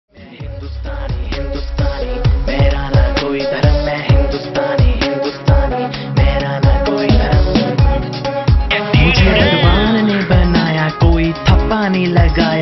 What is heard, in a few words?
Caller Tune